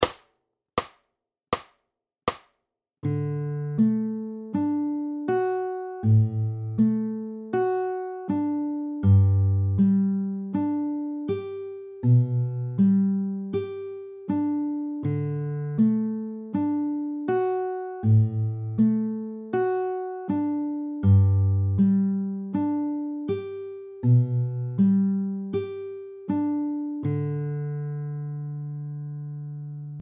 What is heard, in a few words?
Travis slow, fingerpicking